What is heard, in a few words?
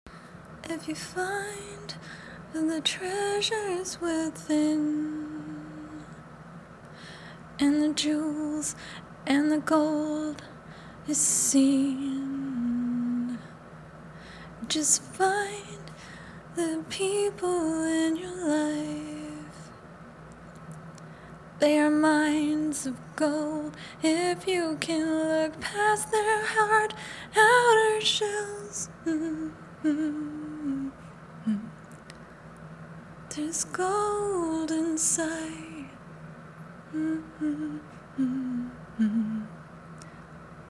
chorus; raw, inspired song ideas